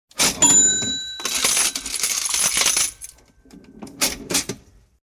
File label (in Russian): otkryvaetsja kassa so zvukom dzyn zvon monet i zakryvaetsja otdelenie dlja deneg